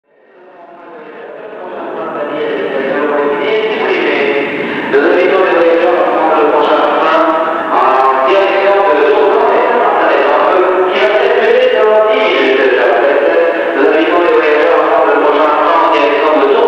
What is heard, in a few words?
shrine dictorphone mexico, street recording